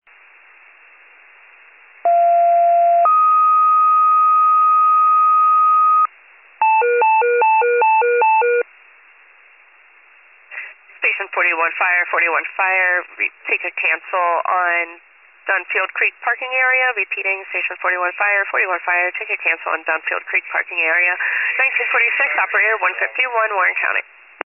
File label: FIRE pager alert